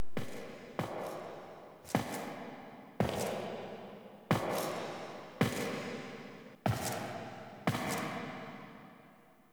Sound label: Foley Enter; Warframe; Cinematics; TheWarWithin; TWWQueensLair